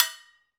Fijidera; Club-50 - Foundations Roland; Agogos

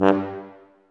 TUBA C1, Solo Brass, stacc; Roland LCDP12

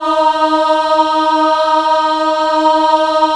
D#3 women ah, Choir; USB Soundscan vol.28; AKAI; WOMEN AHP

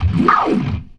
GLISS, ORGAN; Keith Emerson Signature Series

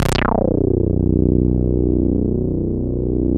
saw res dry, synth

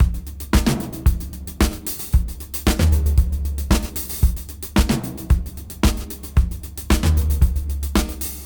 113 BPM; AMG; Keith LeBlanc Phat Butt Jams

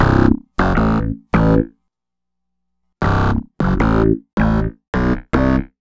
SynthBass, Synth Bass Loops; Sonic Foundry (Sony Creative Software); Groove Elements

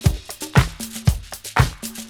Drum Loops

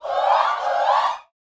SHOUTS, Gospel

